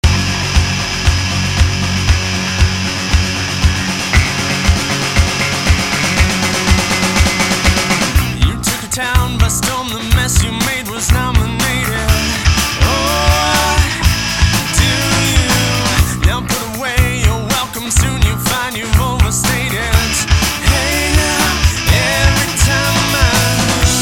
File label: Fortune Loop